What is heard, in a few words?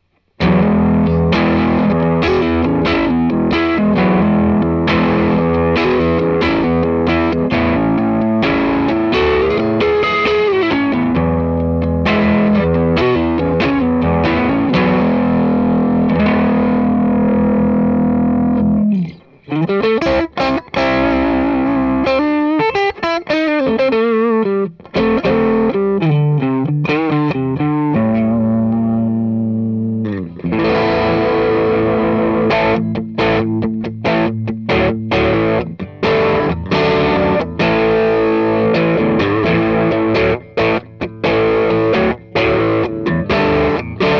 Vox MV50 Crunch